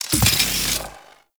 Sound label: Railjack Electrical Hazard Out Of Service Close; Warframe; Vehicles; RailJack Hazards